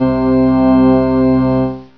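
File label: PIPE ORGAN C2; Alone In The Dark Demo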